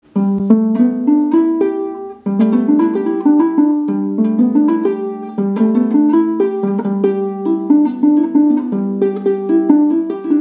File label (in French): Impro 6 cordes N'Goni